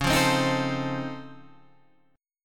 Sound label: C sharp Augmented Major 9th down Guitar Drop D, chord